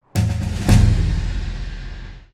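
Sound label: level up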